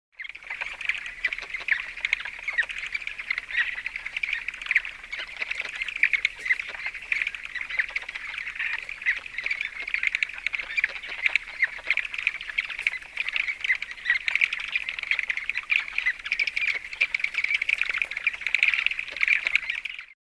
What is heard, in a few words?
ryuukyuuakagaeru call, frog